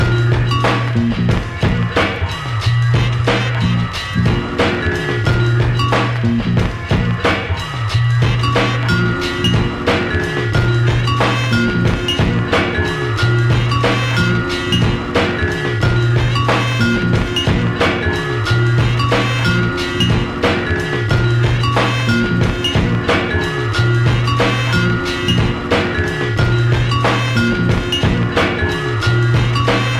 Vague Vibes

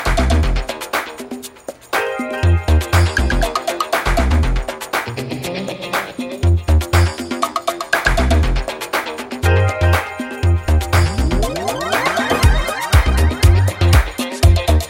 DJ Friendly Instrumental

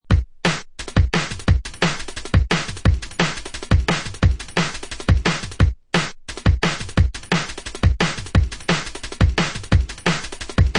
Off Key Steppaz, breaks; jungle breaks; ray keith dread jungle breaks vol 1 60 beats for dubplate clashes battle junkies

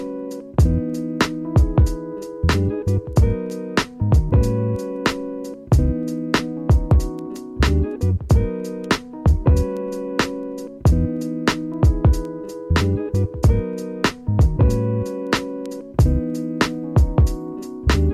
Wind, Jazzy Joint

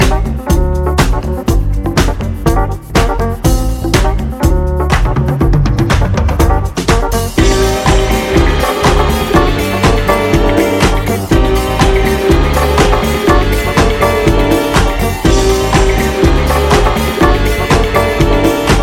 Instrumental

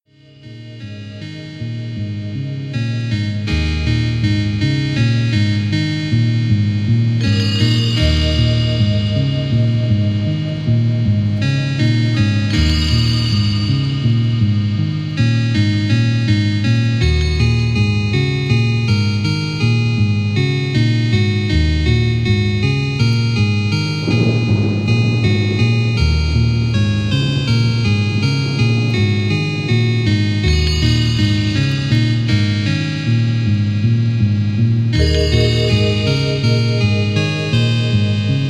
Electric Piano Vibraphone Percussion

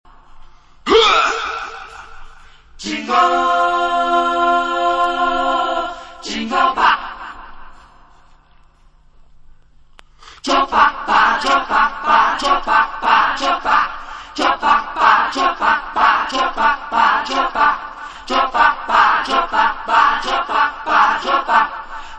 acappella

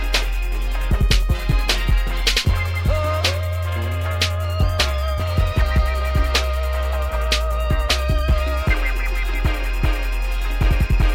Lac Music (instrumental)

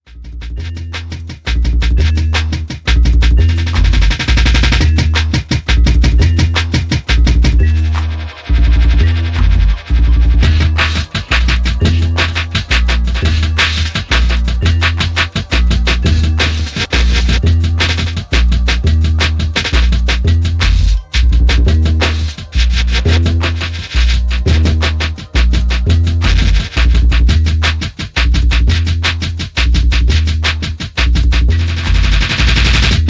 Bass Bells

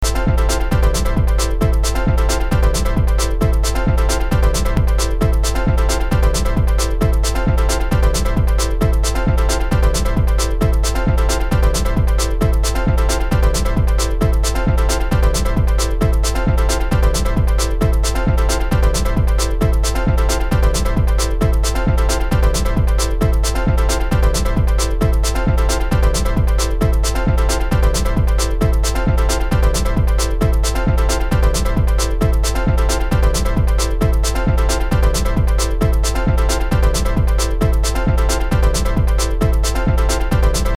Pianoforte [Locked Groove]

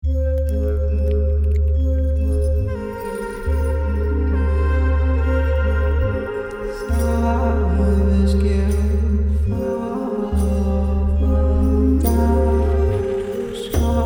Growl

